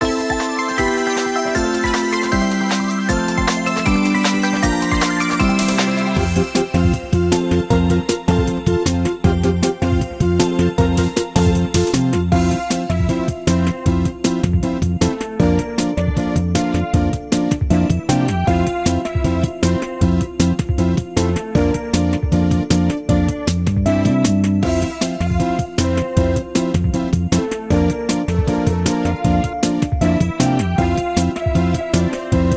game bgm; Fairy Wars I; android

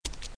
Keyboard Enter; Learn to Fly 2

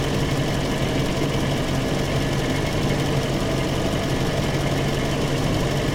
Ambulance Motor

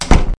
Door Closing

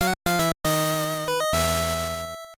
Game Over; Necrow Co; Linux Virtual Boy Windows gamerip

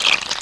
Monster Snake type Death

